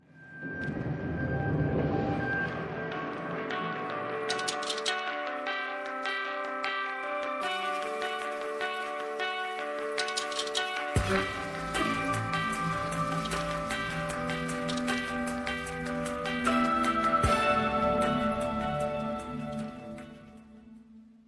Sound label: Cool Sony Tone